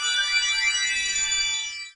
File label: Fruit Appear; Pac-Man Championship Edition 2 Plus